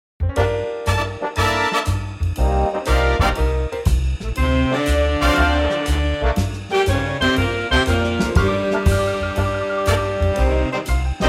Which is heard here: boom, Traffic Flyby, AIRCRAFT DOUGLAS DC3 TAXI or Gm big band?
Gm big band